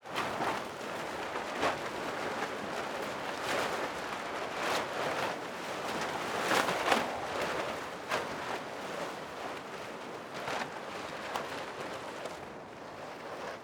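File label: Wind, Exterior, Urban, Plastic, Flutter, Ruffle; Hzandbits; Urban Winds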